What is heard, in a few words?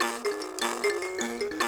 Buzz Kalimba; Roland LCDP11 Africa VOL-1